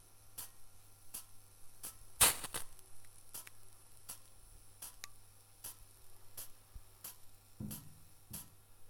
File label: water drops on a hot cooking machine, A small kettle dropping some cold water on a hot cookin